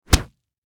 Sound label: Impact Punch Body Hard; Punches and Hits; Combat